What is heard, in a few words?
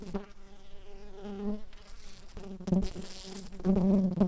Pascuorum workers, bumblebees